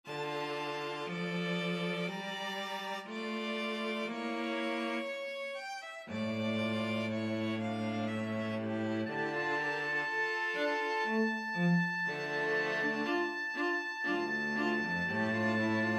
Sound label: oboe and string trio